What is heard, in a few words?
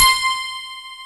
CRYSTAL BEL